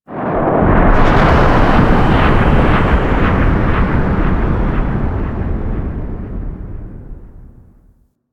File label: Environmental Booms; Ambience; Man In The Wall